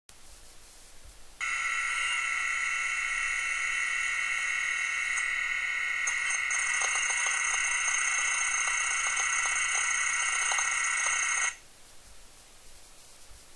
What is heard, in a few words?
Headcrash